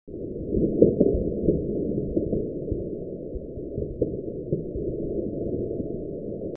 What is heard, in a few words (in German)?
papier resampling